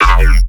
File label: bass hit overtones F; Virtual Riot